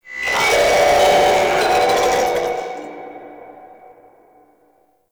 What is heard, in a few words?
Infested Speaker Stack Screech; Warframe; Ambience; Nineteen Ninety Nine